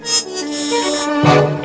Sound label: points; discnoir